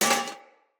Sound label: Foley Armour Step I; Enemy; Mockeries